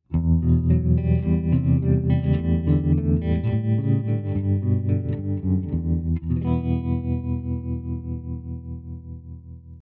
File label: volume tremolo processed